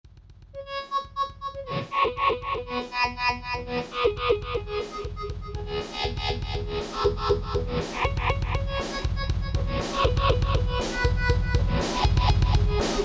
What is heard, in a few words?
guitar; guitar mondays